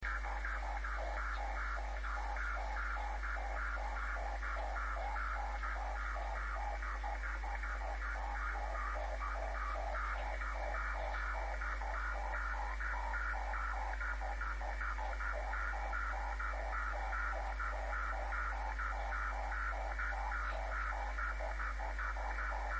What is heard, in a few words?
SSB 80m